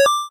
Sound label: got key; convergence